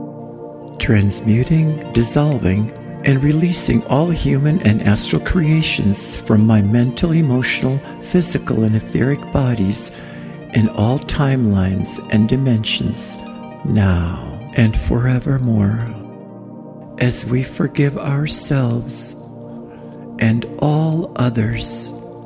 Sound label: HEALING PRAYER